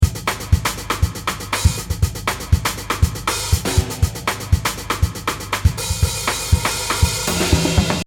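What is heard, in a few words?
drum jazz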